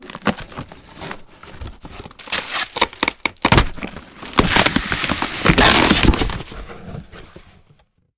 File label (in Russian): prodolzhitelnoe shurshanie pachkami chipsov